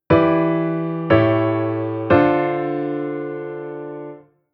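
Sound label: Chord Secondary Dominant RelIItoV7ofII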